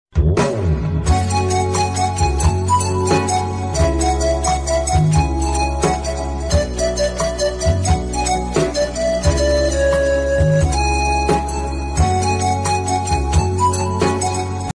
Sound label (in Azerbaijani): telefon zengleri; hezin musiqi